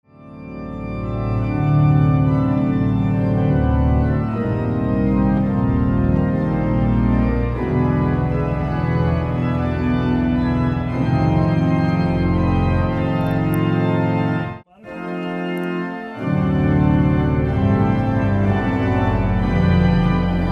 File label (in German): frauenkirche orgelspur